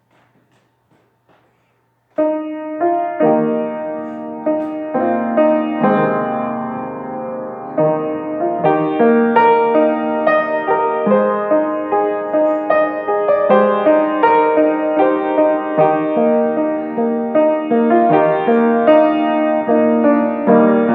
Piano; Send Us Light

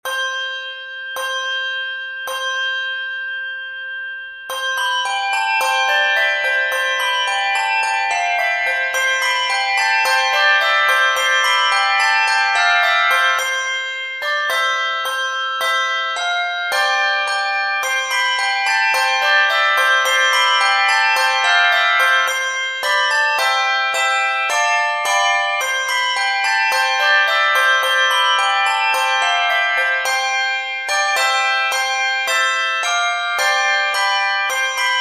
He Is Risen, 2-3 octaves

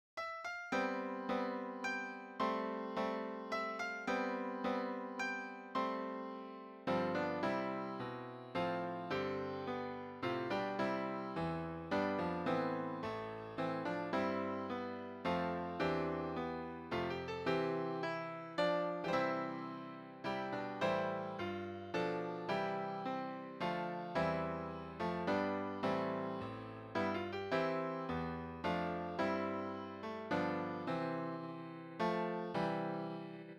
The King of Love My Shepherd Is instrumental

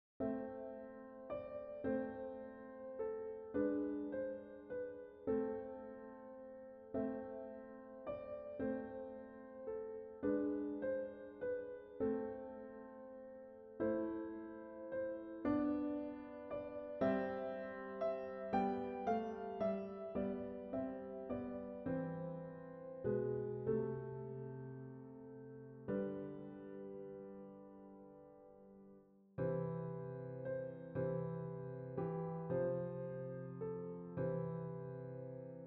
We Three Kings of Orient Are, Piano

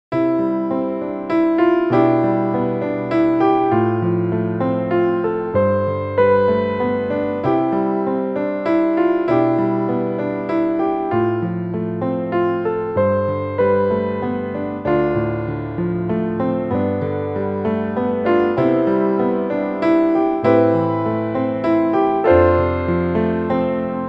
Piano; Count on the Values

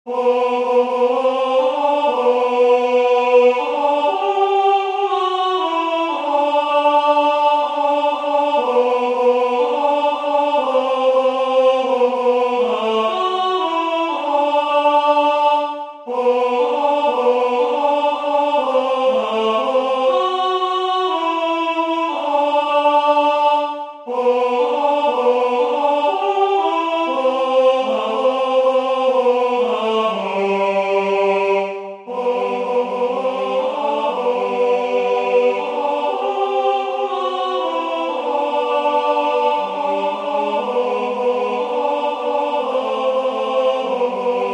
I Am A Child of God Vocal Trio

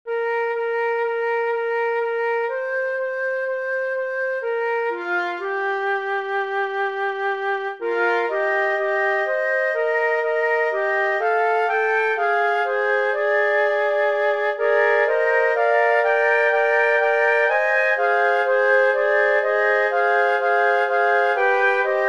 Flute Choir, Eb Major; Because I Have Been Given Much

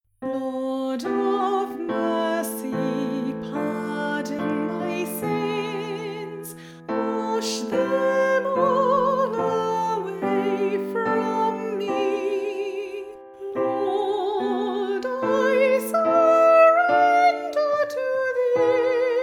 Lord Of Mercy with vocal

